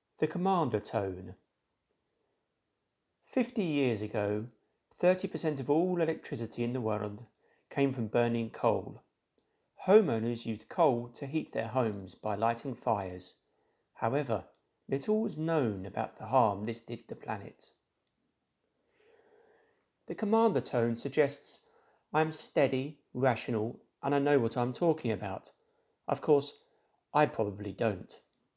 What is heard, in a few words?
Commander tone